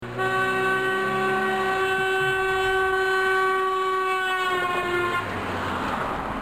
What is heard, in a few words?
doppler